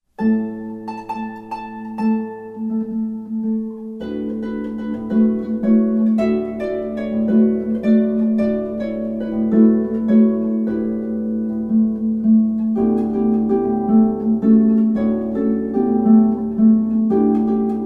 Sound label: tango